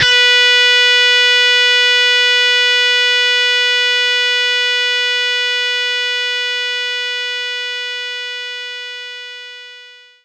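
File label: Distortion Guitar B3; knights try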